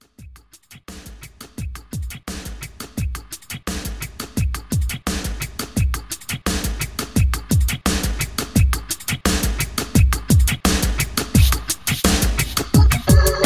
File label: 90s Hiphop Re Drum